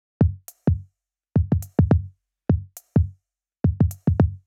105 Loose Grooves, Percussion; Touch Loops; ElementsOne; Abstract Rhythms

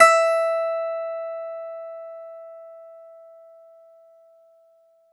Clavi, Digital Keys

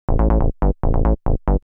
TECHNO BASS 140 A

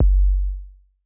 C2 Pure Tuned Kick, Tuned Sub Kicks; One Shots; Touch Loops; ElementsOne; Abstract Rhythms

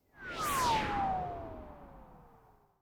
FX Industrial 140

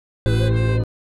house synth 125 A, keys

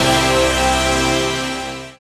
dance chord

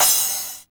909 CRA, TR-909

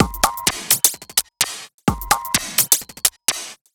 perc 128, Electro percussion loops; Anthemic

